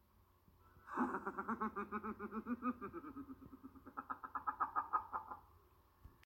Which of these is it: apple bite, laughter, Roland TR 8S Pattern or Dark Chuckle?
Dark Chuckle